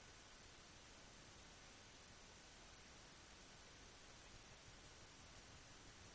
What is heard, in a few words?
chains; halloween; halloween soundboard